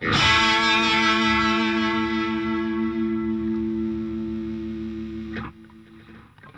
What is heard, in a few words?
Funk Guitar; 90 s Sample CDs; Zero G